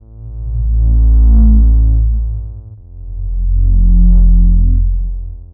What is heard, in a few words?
Hut Bass, Drum n Bass; KJ Sawka; Drum n Bass & Beyond